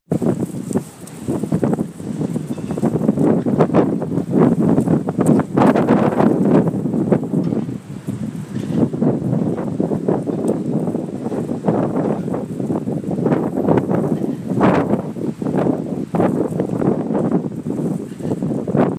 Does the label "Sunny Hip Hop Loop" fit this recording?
No